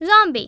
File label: zombie; plzombie; The Interactive Alphabet